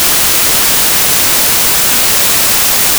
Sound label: white noise; synth